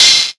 Star Instruments Synare PS-1

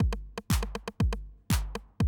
120, Classic Drum Loops

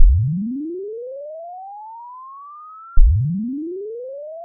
Partials Sine Proportional, wavetables; Architecture 32

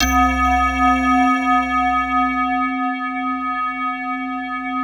PAD, DIGI-PAD

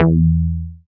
BAS FM DX-7

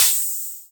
Open HH, Cymbals

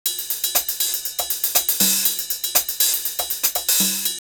R8 Hit D Hihat 120, drumloops, DrumMachines; X-Static Goldmine

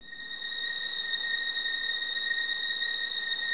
violins A#6, String Ensembles, 23 Violins; Miroslav Vitous

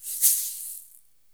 PERC, Percussionworks; Sampleheads; NYC Percussionworks; 90 s Sample CDs

